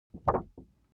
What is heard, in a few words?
object paper wobble high short